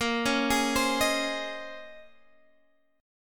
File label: Bb Minor 11th Bb